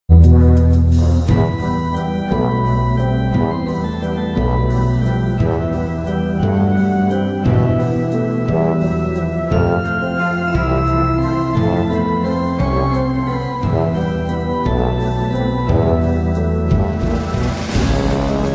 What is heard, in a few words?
haunted carousel